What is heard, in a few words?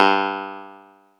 Clavinet G2; USB Soundscan vol.09; AKAI; Keyboards Old School